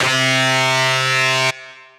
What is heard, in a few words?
Kazoo Lead, Synth Lead; Ableton; Factory Packs; Build and Drop